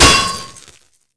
cbar hit; tf2classic

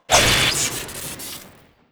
buzzsaw swing miss crit; tf2classic

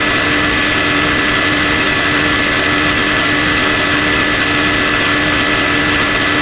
tank mini spin; tf2classic

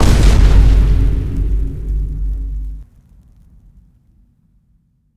moyai explode, weapons; tf2classic

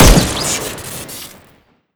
assault rifle shoot crit, weapons; tf2classic

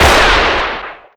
revolver engi shoot; tf2classic

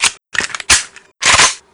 mk18 world reload; tf2classic

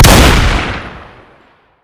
lever shoot, weapons; tf2classic

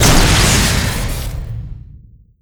shoot crit; weapons; tf2classic

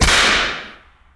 discipline device impact; tf2classic

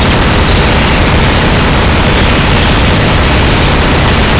fire crit; weapons; tf2classic

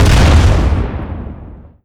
airstrike small explosion; tf2classic

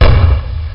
gl shoot, weapons; tf2classic

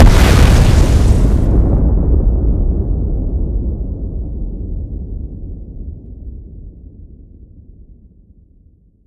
bomb explode; weapons; tf2classic

